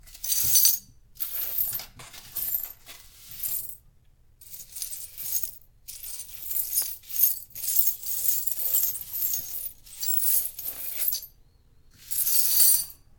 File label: clean up glass